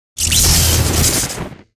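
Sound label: thunder spirit attack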